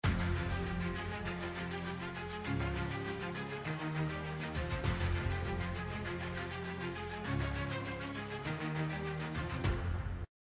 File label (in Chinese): 紧张氛围